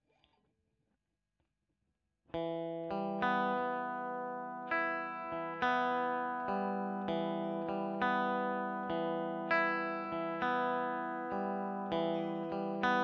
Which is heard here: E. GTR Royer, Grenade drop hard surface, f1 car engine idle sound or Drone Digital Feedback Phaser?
E. GTR Royer